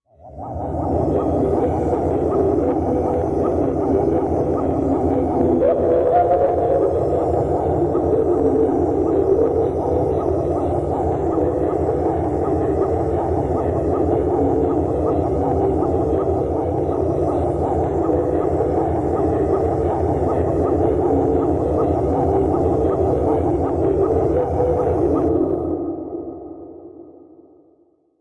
Space Kennel Space Dogs, sci-fi ambience

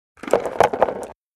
Rock Gravel Pour Few

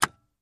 Dodge Van Switch Pull; switches

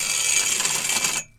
Chain On Pipe Quick

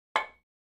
Free Weights Exerci

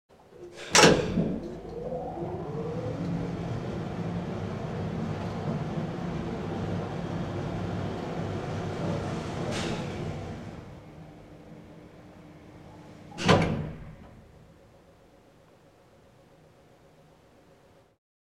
Old Type Elev Gate, elevators

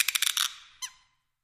Thumb Screw Turns, gears

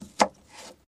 Cutting, Slicing Carrot, Single Slice

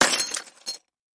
Picture Frame Crash; housewares

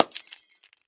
Light Bulb Crash

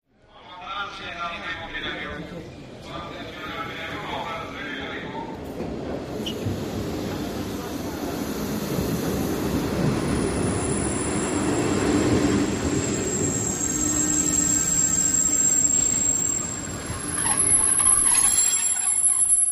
Train Station europe

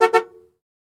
Jeep Cherokee Horn Honks, 2 Short

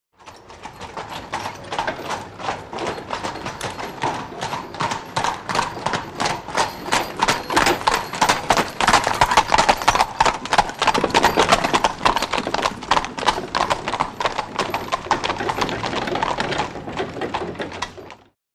Horses Stop Four Hors

